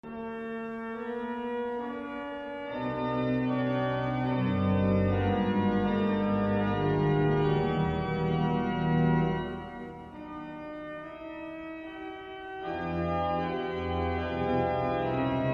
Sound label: HARMONIES OF PERCEPTION, organ; organ symphonies; An introverted but at the same time demonstrative work. Melody accompanied by exquisite harmonic dissonances.